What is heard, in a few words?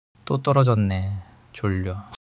crying